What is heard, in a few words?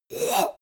monster gasp; kreaturen